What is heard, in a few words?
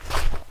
fs gravel, singlesteps